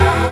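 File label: synth beat; Best Service Gigapack 1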